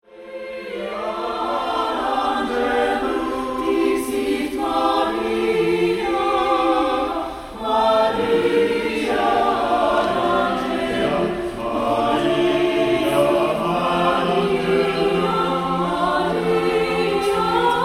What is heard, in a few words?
Latin Renaissance